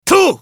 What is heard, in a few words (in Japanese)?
攻撃短; エデュアルド